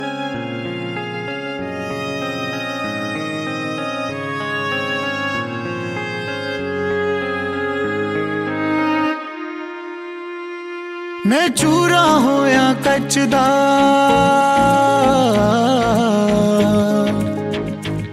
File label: new punjabi song ringtone